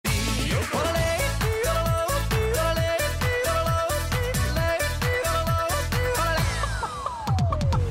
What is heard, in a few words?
chicken yodeling; bibi hendl